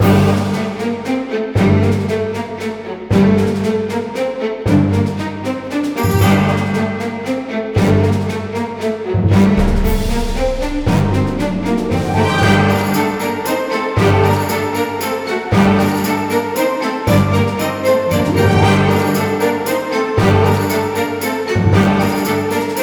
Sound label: euphorium battle loop a